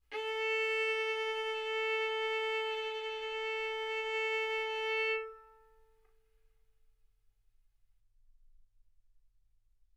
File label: Violin arco A4 B4; mf; sul A; stereo